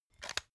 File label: pepper mill; soundpool